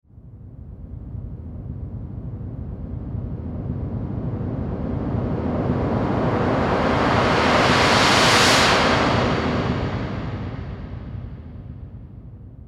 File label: noisy riser